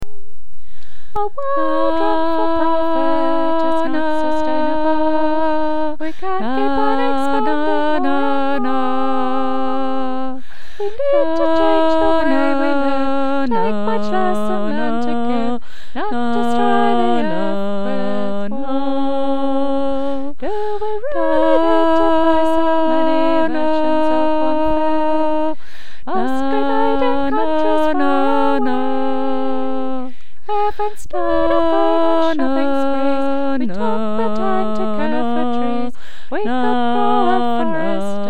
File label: no more shopping alto